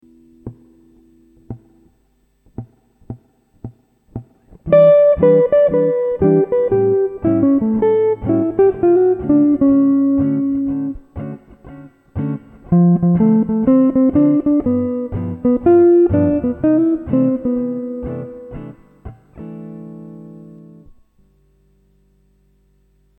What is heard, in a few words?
solo, guitar